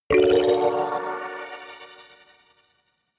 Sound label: notification kalimba magical major